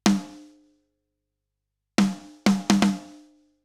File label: Snare; Pro-Q3